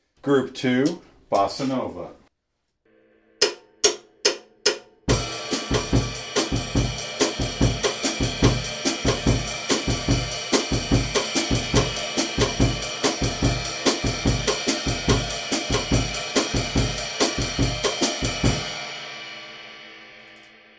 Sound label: bossanova